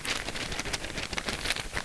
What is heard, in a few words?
paper flap